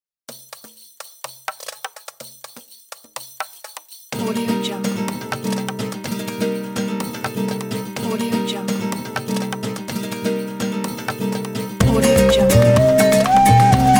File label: arabic rumba